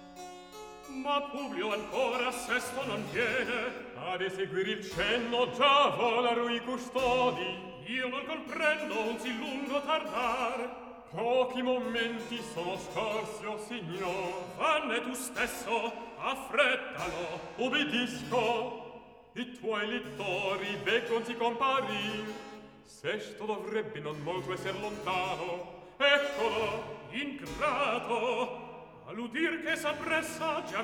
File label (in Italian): Atto Secondo Recitativo; Publio, Tito; Ma Publio, ancora; Mozart; Operas